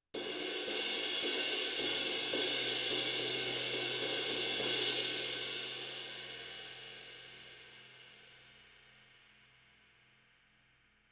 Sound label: 20 CRASH BUILD, cymbals